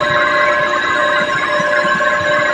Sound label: computer console, ambience spaceship